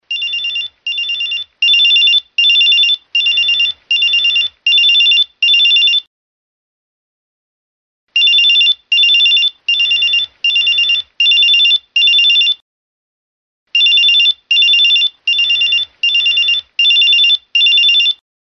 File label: New Alarm Iphone